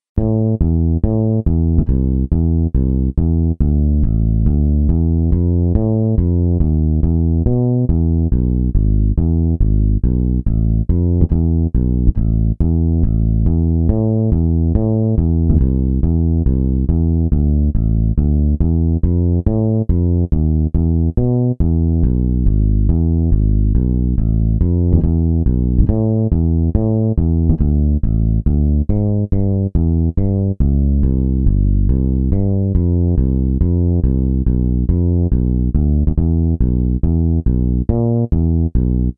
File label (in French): Basse seule